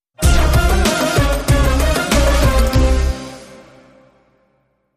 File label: Arabesk (Modern Remix)